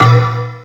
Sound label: PERC, PERCS KIT